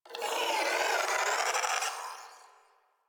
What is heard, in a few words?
Shadow Vocal Idle; Warframe; Duviri